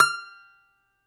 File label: DOMRA